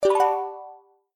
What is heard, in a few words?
Number Selected; Wealth Gods2